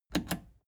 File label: plastic button scratch smooth click disable fast